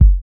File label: low kickdrum sound g key, Royality free bass drum single shot tuned to the G note